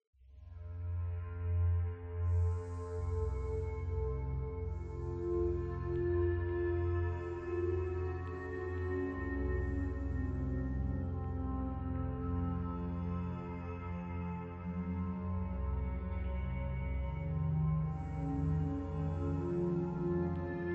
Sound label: Scary Theme; Dual Blade: Battle of the Female Ninja; Scary Theme 1 Version 2